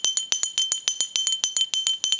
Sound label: bell